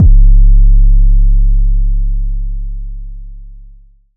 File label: keys, Kicks